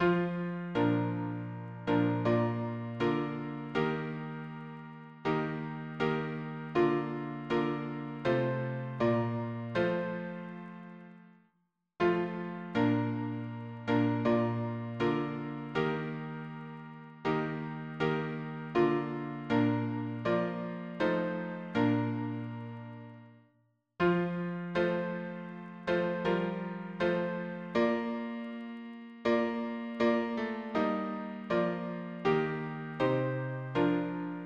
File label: harmony piano